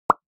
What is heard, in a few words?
reparation ui click